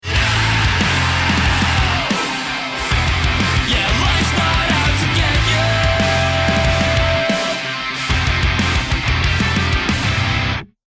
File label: round mvp anthem, music